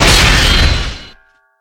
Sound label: impact heavy